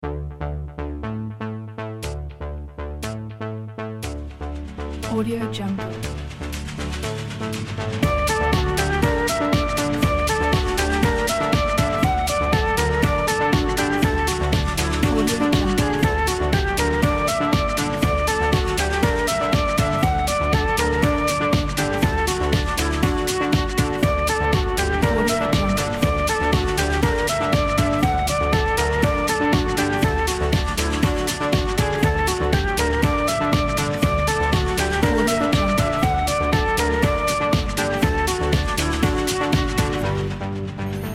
oriental beat

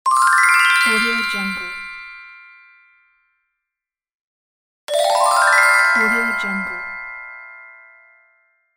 bonus sparkle; medusamusiclab

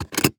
toolbox handle move; prosoundfx

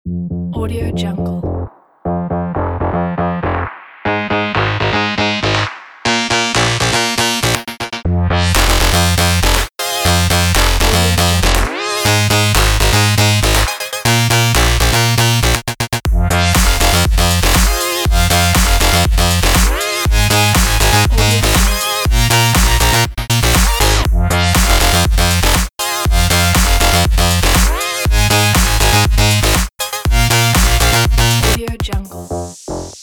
electro

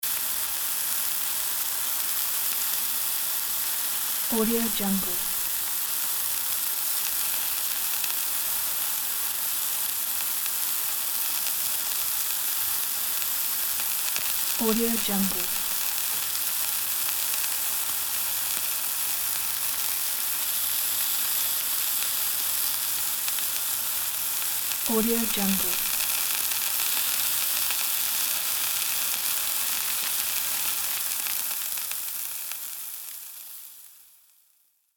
sizzling meat

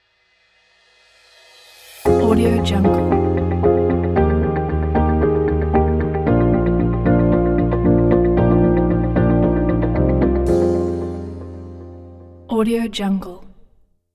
uplifting chill ident

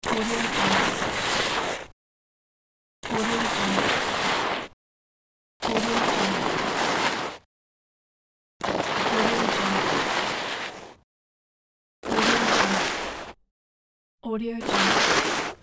snow sliding

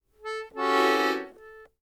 accordion; prosoundfx

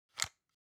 object plastic hook fold in; smartsoundfx

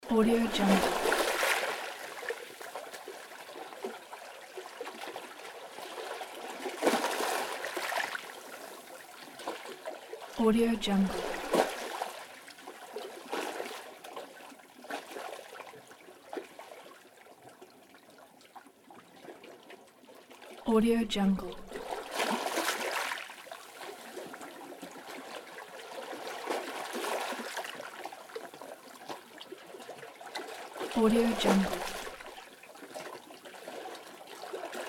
ocean waves gentle; justsoundeffects

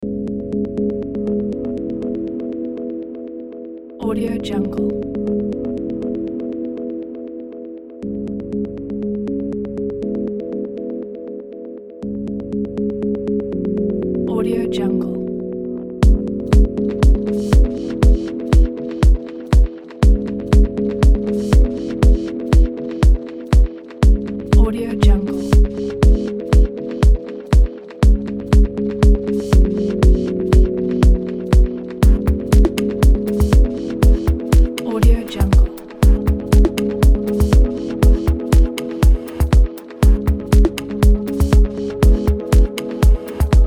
minimalistic abstract sound

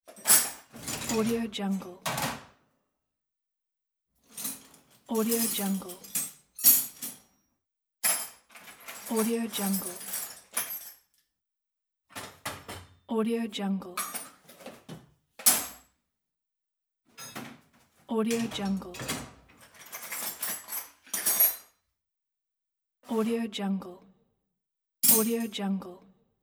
looking for tools in garage

